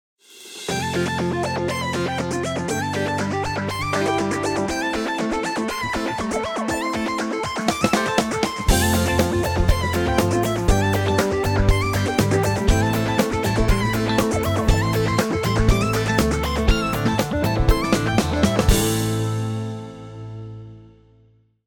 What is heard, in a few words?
Electronics, ringtones; Tinno ATT Calypso 3